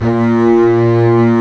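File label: arco basses; E-MU Formula 4000 Series Vol. 5; Protozoa; Proteus2 Presets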